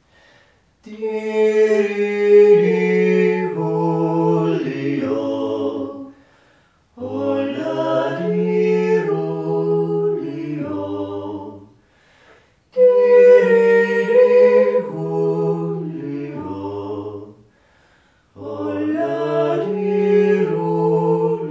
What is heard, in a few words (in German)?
Allerseelenjodler